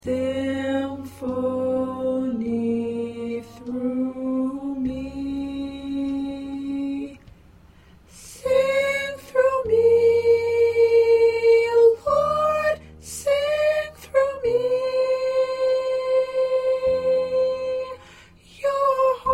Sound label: Soprano, choir parts